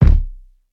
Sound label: deep steel kick drum c key, kick single shot tuned to the C note